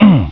pain; duke

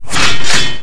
armor

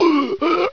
drown

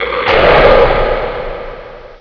attack, dragon

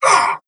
pain; doomguy

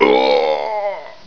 death; dusk

